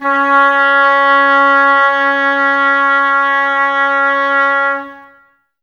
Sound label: OBOES C#, Oboes, Wood Winds; Miroslav Vitous - Woodwind Brass Ensemble